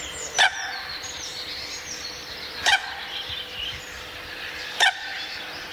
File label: eurasian coot call